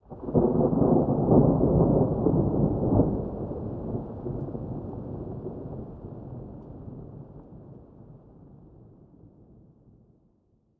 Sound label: ambient; World of Tanks